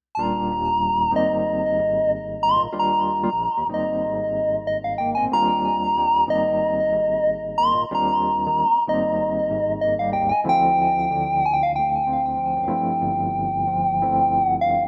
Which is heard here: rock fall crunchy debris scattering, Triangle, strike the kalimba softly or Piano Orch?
Triangle